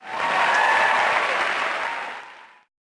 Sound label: friendship level up